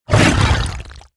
Attack

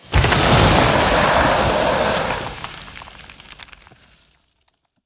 Quicksand Elemental Death

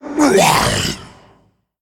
Male Faceless Death

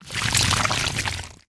Tentacle Play; Tentacle Of NZoth

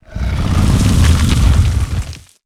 Enter Play

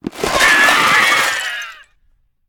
Bucket of Soldiers Death

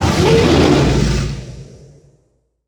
Bone Drake Death

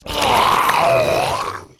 Carnivorous Cube, Death

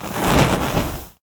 Candle Attack

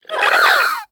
Attack

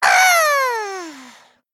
Male Gnome Death

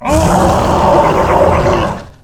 Augmented Elekk Play

